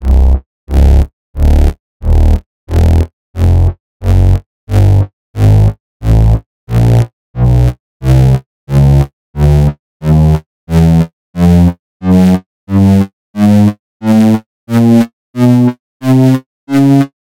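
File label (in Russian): звук басового синтезатора созданный на модульном плагине напряжения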